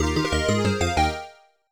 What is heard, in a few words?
Item Get; Mario & Luigi - Bowser's Inside Story; Attack Piece; Sequenced